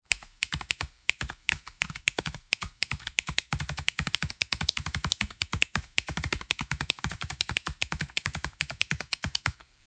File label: big robots hand movements